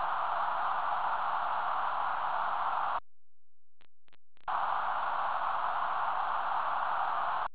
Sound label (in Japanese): band 1k, 1/1オクターブバンドノイズ 1000Hz -20dB